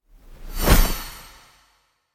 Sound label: Wings Swing; Imperius